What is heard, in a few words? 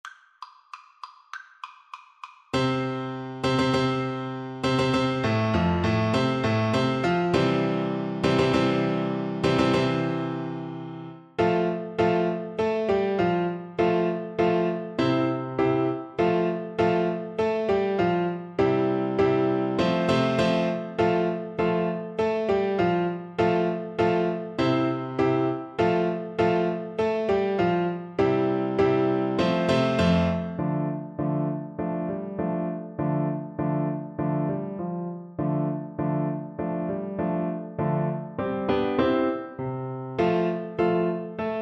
rossini william tell, Tuba